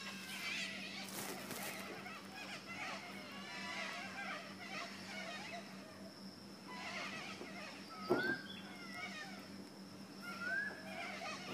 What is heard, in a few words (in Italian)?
cacatua eheh